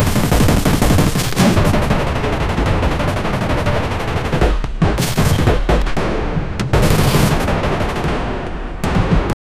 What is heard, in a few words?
loopable intense pirate b, naval